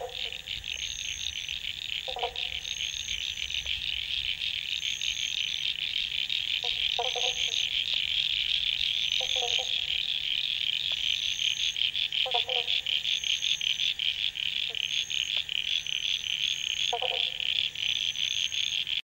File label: frogs old tressle bridge australia